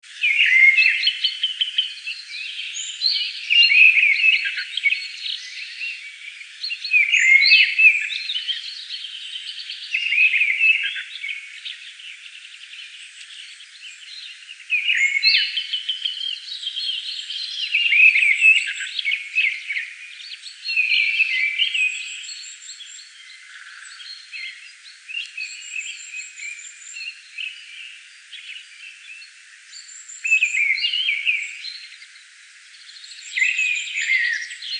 Misteldrossel; Ornithologie

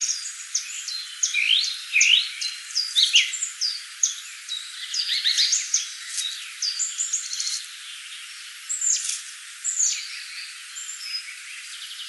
Zilpzalp; Ornithologie

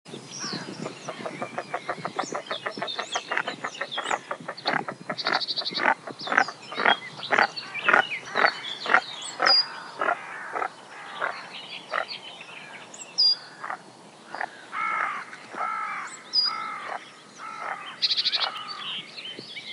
Gaensesaeger; Ornithologie

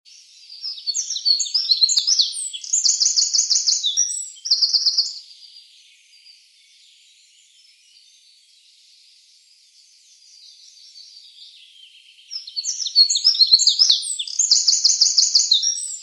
Zaunkoenig; Ornithologie

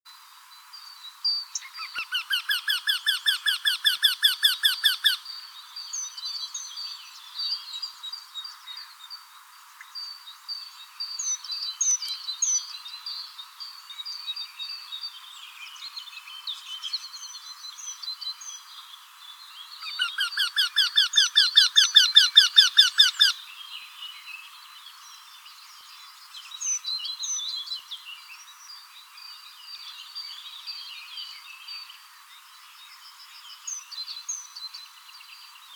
Wendehals; Ornithologie

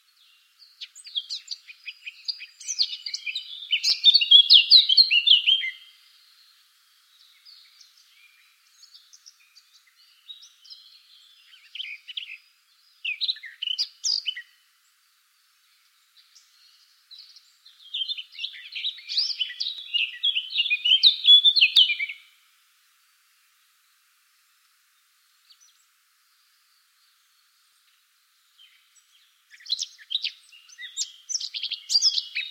Moenchsgrasmuecke; Ornithologie

